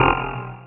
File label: dark stab; Sonic Foundry (Sony Creative Software); Synchro Funk; One Shot Sonics